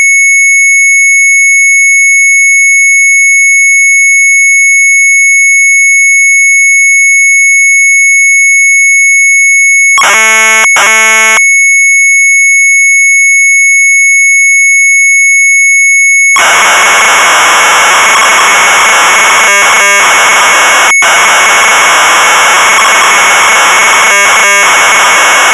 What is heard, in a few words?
MZ800 Tape